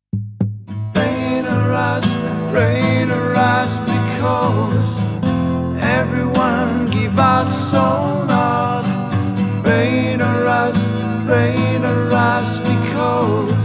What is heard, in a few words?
acoustic version